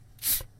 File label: Fizzy Drink Opening